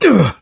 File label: melee swing, player survivor voice